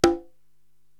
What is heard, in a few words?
Conga Hi-Slap; Korg TR-Rack